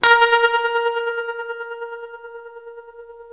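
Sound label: tremolo A#3, guitar; East West; Steve Stevens Guitar Samples Collection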